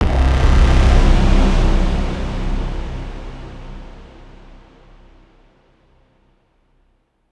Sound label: synth hit C; Cinematic Trailer Loops